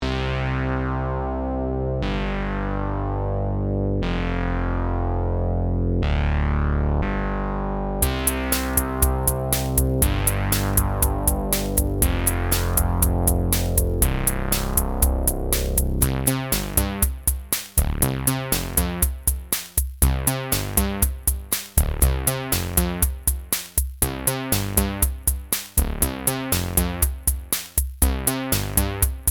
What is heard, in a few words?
The Keytar Groove; daily-groove